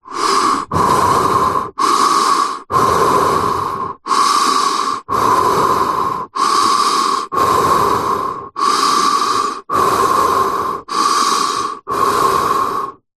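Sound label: human person breathing inside motorcycle helmet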